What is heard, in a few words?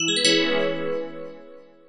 Bells